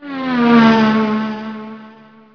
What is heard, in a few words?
car